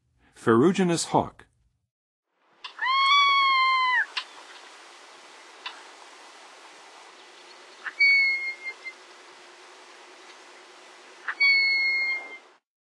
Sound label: Ferruginous Hawk, Birds of North America; Western USA; Western USA Songbirds